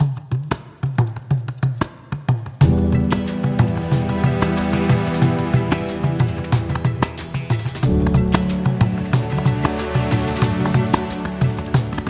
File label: Indian Traditional